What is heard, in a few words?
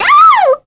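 Vox Damage, Korean; Annette; kingsraid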